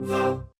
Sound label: The Real Bass robot, Yamaha DJX 2B